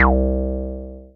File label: Synths BASS